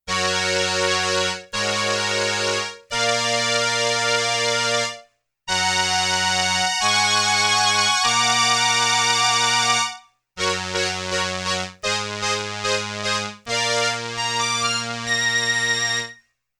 STRINGS II with vibrato & Ensemble II, Roland RS-202